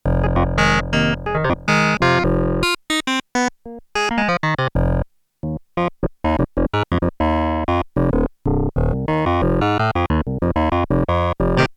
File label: CLAVINET, Korg DW-8000; Factory Patches